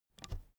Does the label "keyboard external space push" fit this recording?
Yes